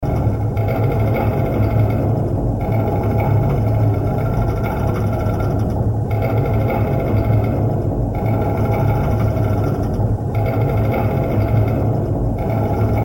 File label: Ambience Gears